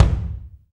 BD Real

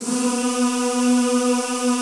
ZZZ B2, Classical Choir; Best Service; Extended Classical Choir

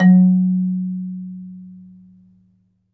MARIMBA B; ILIO

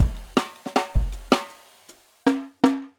160 live jungle, breaks

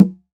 Conga Battery; EMU E-III; Samples vol.55 - Retro Sampler

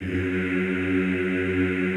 EEH F#1, Extended Classical Choir; Best Service

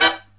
HI CHORD, Brass; USB Soundscan vol.29 - Killer Brass Riffs; AKAI; HIGH CHDS

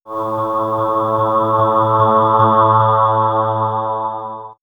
Swirls, pads; TheVocalizer; X-Static Goldmine

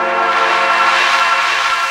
pad, Drum & Bass, 174 BPM; Ueberschall; Drum & Bass Flash Trackz

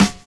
SD, Drums Dance & Groove; USB Soundscan vol.11; AKAI

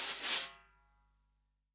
snare drum A, SWING SNARE CHROMATIC, REAL DRUMS

